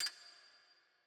ambience room metal base

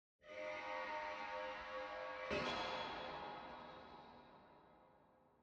ambience arena creak

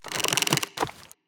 tree golem big strike; Shelter

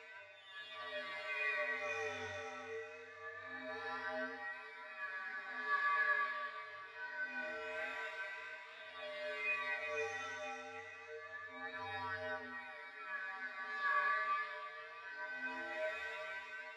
spell draw idle loop; Shelter

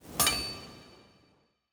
ambience room forge pickaxe ready

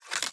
battle preparation exit

